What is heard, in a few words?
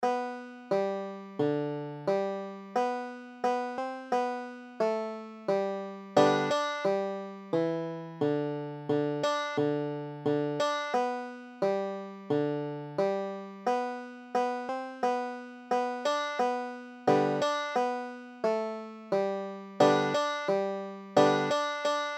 g, banjo